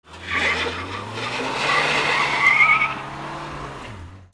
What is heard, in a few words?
CARS